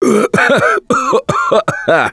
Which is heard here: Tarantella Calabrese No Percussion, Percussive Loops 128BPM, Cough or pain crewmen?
Cough